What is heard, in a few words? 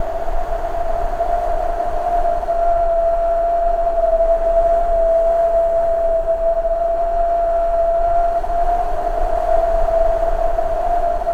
WIND HOWL, VOCAL WIND; ILIO; Vocal Planet; Jazz & FX